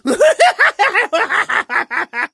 Streaming Waves

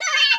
Wizard Mini Laugh

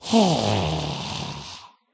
Beach Groan

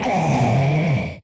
Grunt

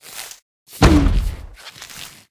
Sling Pea Lob

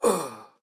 Wizard groan

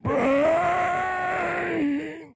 grunt

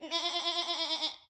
Sheep

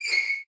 squeak

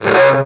Smash

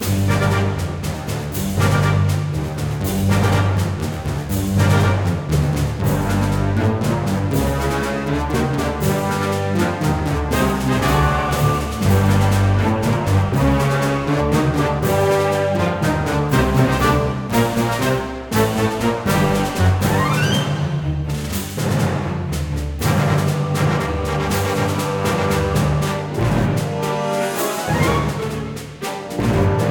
boss loop

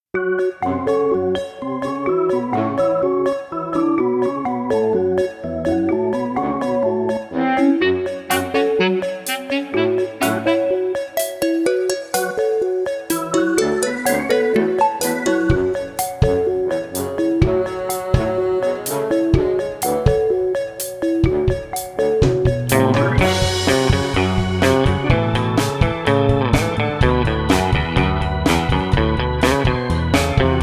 Wave 2

